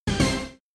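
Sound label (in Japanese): クイズ出題・シンキングタイム風な効果音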